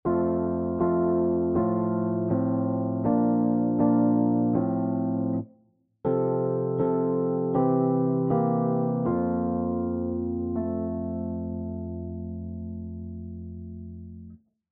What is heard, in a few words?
Inversions Suspensions